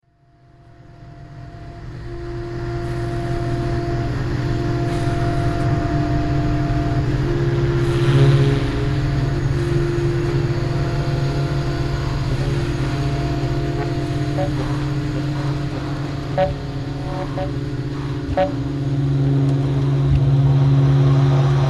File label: sax sound